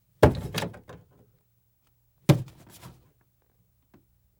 Car Door Grab; Fairlight Sound Lib

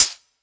Close Hi Hat, dubstep; Ghosthack; Demolition; Free Dubstep Samples; Drum Shots; Venomous

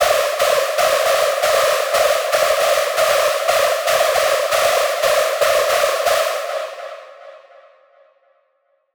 screech loop C# min 155; Music Loops; GhostHack

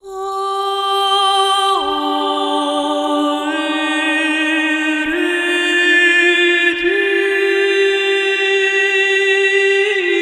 Main Vocals - Cm 70bpm (WET); Construction Kits; Ghosthack; SkyRealm; Kit 19 - Ode To Daenerys

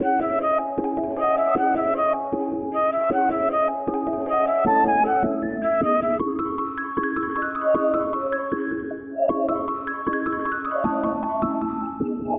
155 BPM C# Min, Melodies; Cymatics; 2022 Melody Mystery Expansion

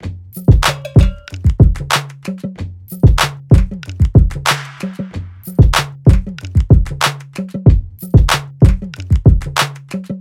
Booty Pop Drum Loop, 94 BPM; Cymatics; King Drum Loops

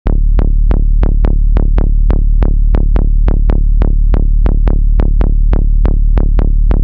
Bass Loops D (140 BPM); FL Cloud

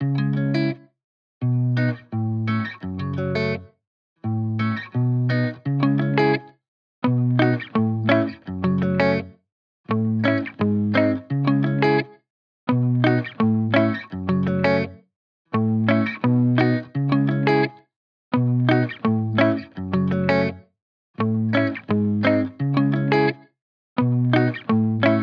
170 BPM C# Min Main Guitar; Loop Stems; Cymatics; Playground

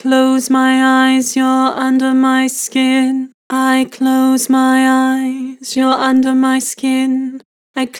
Vibrato, Vocals; I close my eyes; Harmor Vocals

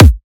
Kick, Drums; One-Shots; Kicks; Ghosthack; Color Bass Essentials

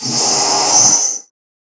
perc oneshot; GhostHack

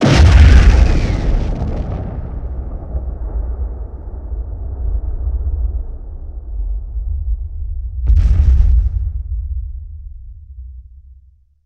Explosion Large 2x Low Rumble

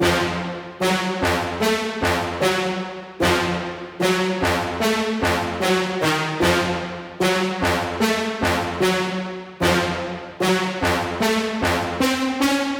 150 BPM F Min Brass; Melodies; Loop Stems; Cymatics; Hype

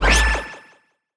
Collectibles Sci Fi FX; Cymatics; Video Game Sample Pack Vol 1

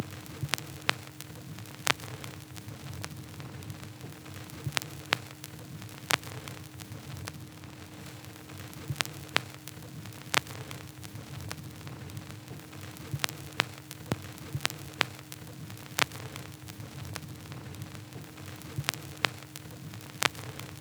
Vinyl Noise; FX; Cymatics; Eternity Sample Pack

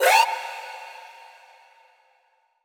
Vox, Trap Vocals; Cymatics; Trap Starter Pack

